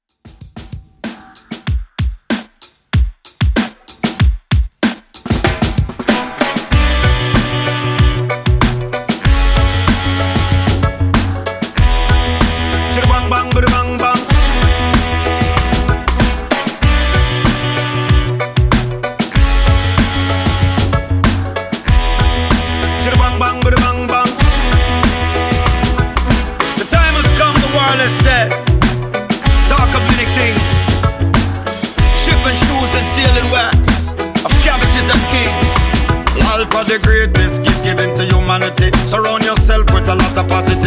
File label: Reggae 95 Bpm; Redrum